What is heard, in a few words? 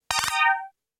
Insert Coin; Namco Classic Collection Vol.1